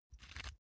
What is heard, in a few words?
small pepper grinder pressured quick; industrie-wirtschaft; gastronomie